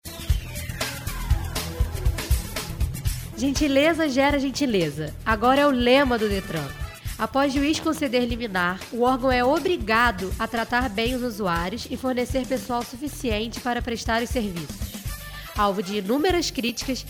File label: Lead